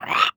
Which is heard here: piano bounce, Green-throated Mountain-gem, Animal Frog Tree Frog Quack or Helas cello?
Animal Frog Tree Frog Quack